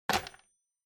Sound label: Rotary Phone Hardware Receiver Pick Up